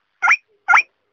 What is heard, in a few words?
guinea pig talk, animals